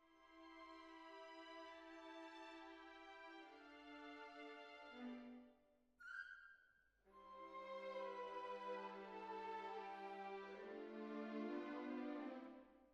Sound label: Cbasses